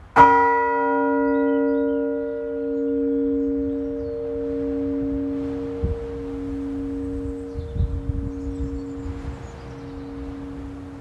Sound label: cowfold, bells